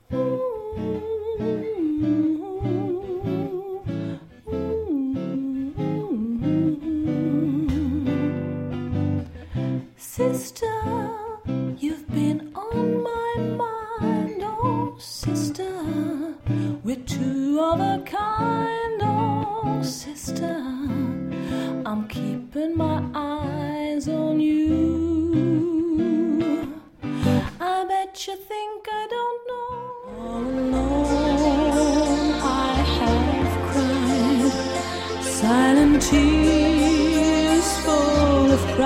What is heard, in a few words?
viola mix